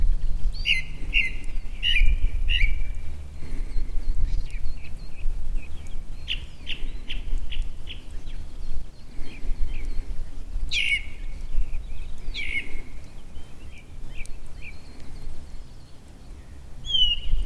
Ringdrossel; Sudelfeld RO; Joerg Langenberg

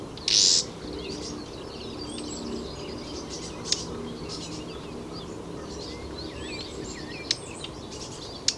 Braunkehlchen; Murnau; Michle Peron

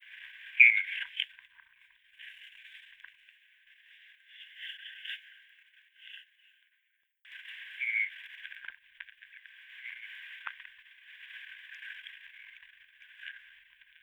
Mornellregenpfeifer; Hopferstadt WUe; Rainer Jahn

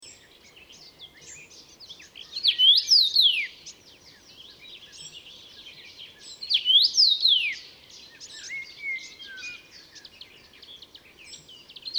Karmingimpel; Murnauer Moos; Michle Pron

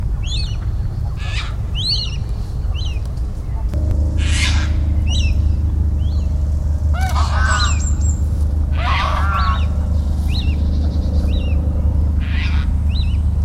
Raubseeschwalbe; Ammersee Binnensee; Julia Wittmann

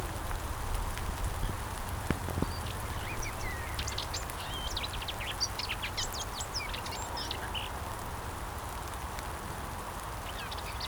Weissbart Grasmuecke; Landschaftspark Unterhaching; Kirsten Kraetzel

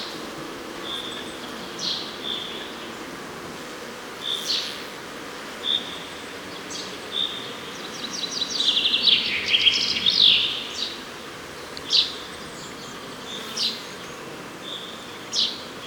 Buchfink; Garmisch; Michle Peron

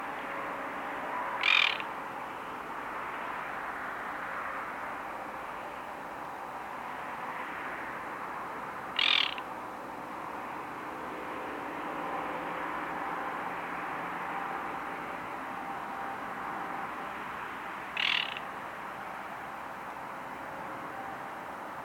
Zwergsumpfhuhn; Ammersee; Ingo Weiss

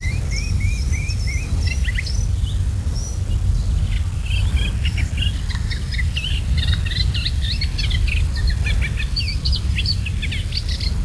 Mariskenrohrsaenger; Schafwaschener Bucht Chiemsee RO; Andreas Lange

